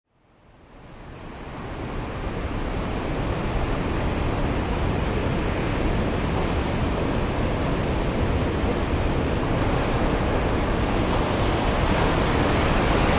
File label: praia da vagueira, Sea & Waves; Nature